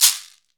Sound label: CAXECERE, Latin Shakers